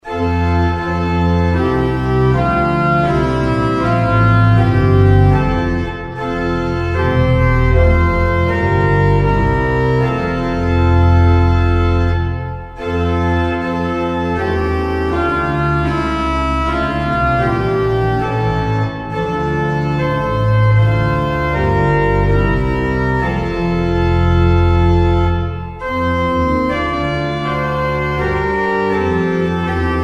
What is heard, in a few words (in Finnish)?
trumpet soolo; urkutieto